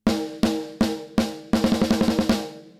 Big Snare; Xtressor

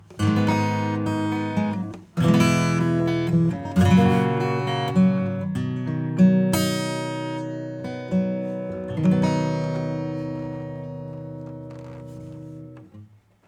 acoustic; Sugar

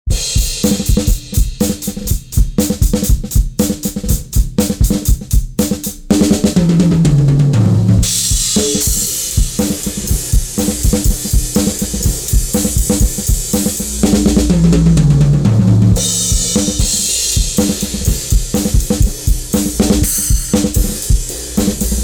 drums; smart EQ 3; low bypass reversal

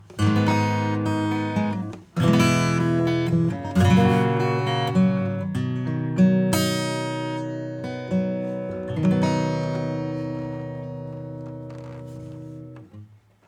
acoustic; MorphVerb

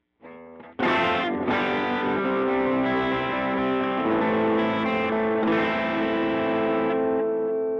tube delay wet time right feedback long treble

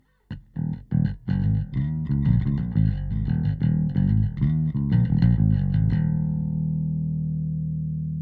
bass; AMEK

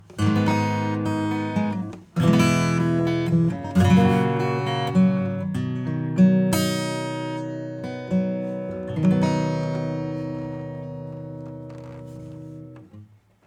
acoustic

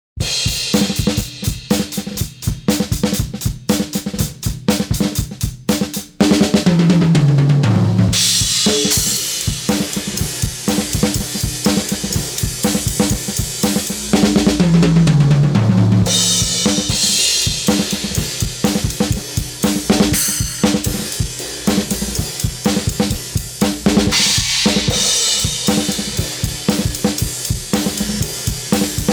EQ High Pass; Kirchhoff

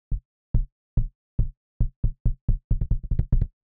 kick; SSL X Gate

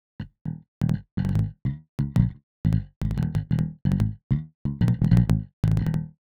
bass Less Sustain on the Bass; Extractor

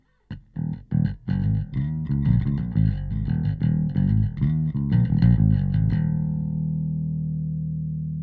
bass

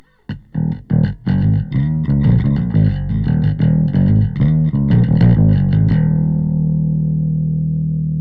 808 Bass; SubBass Doctor; SAT 100

